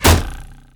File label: Bow impact wall; 100rogues